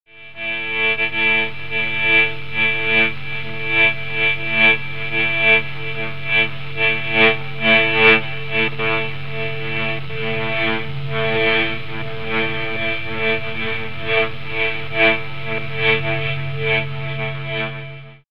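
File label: Egtr earphone, electric guitar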